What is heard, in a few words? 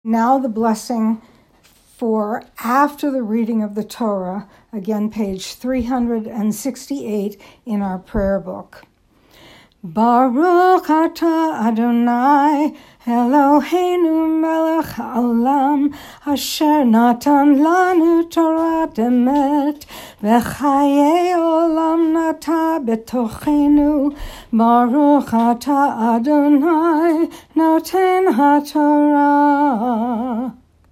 Melody after Torah Brachot